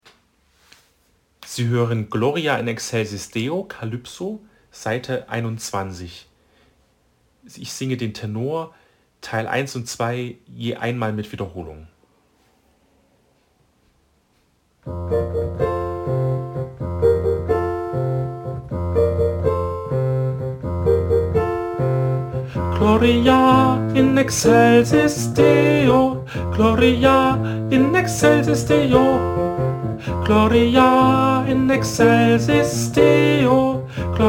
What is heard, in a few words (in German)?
Calypso Tenor; Gloria